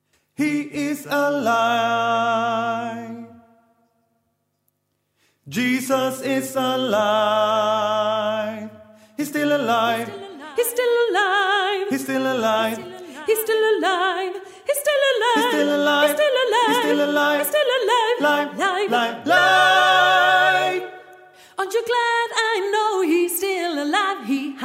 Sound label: Sopr ten